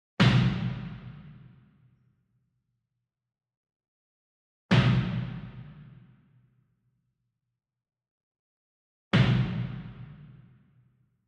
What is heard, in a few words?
Bass Drum Marching Hit Soft Beater Far; SINGLE DRUM; boom library; Cinematic Strikes Construction Kit